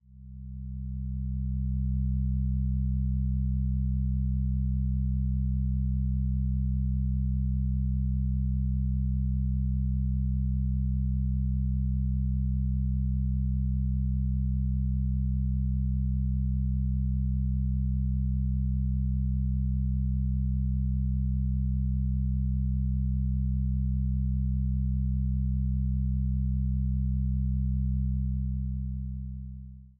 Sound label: tension bed; chess shorts